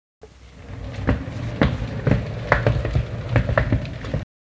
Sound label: Rolling Suitcase